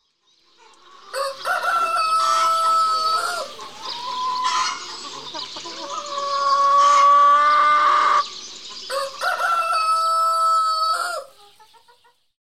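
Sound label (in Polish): zwierzęta gospodarskie, głosy; trzylatki; karty aktywnosci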